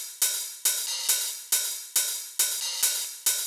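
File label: hi-hat, Techno 138 BPM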